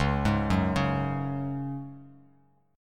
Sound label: Db Suspended 4th down, Bass Standard chord; arpeggiated